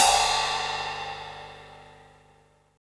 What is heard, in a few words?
ride, Drums Acoustic; USB Soundscan vol.10; AKAI; DYNAKIT 1